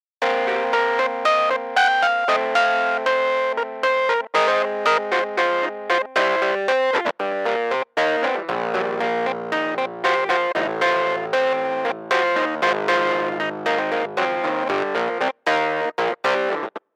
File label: Stevie Fuzz; Classic Keys ROM Option